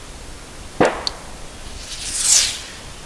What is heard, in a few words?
9MM FMJ RN 1100 YDS; sound of bullets